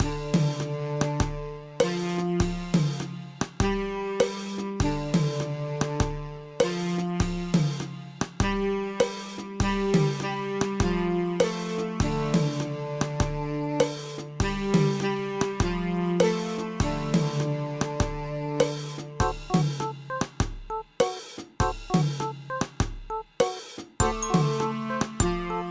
jazz muzak; Slugocalypse

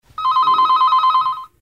Phone ring; Bad Water

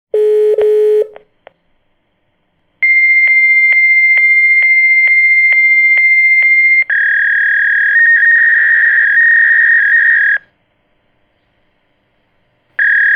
Fax machine sound; Re Dragon

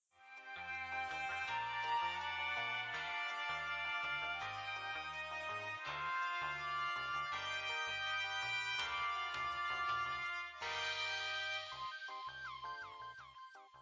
lift music; Cannery Vale

